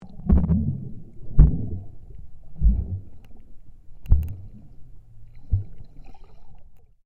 Underwater Movement Sequence, Submerged impacts created by dropping a weighted rope into the; Submerged impacts created by dropping a weighted rope into the ocean; Splashes